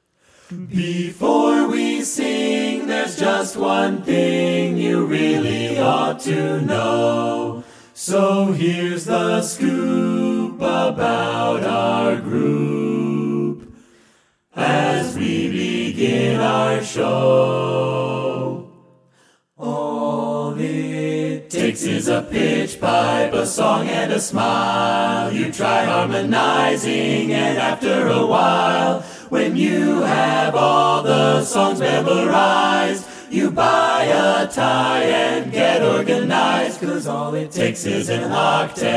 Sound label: Pitch Pipe